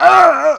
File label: male die; firefight